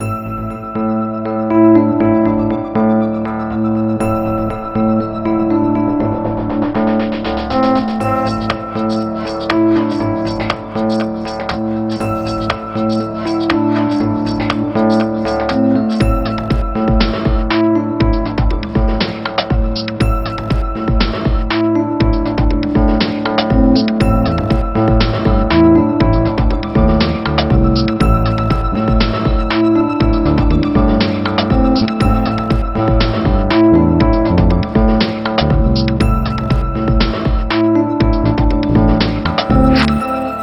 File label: battle